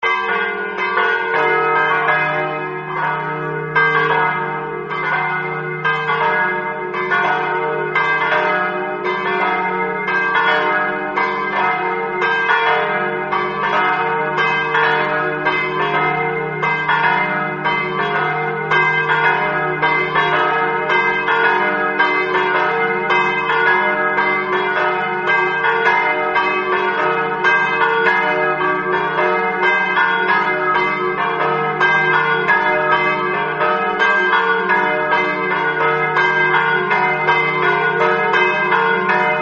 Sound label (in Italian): campane; manzano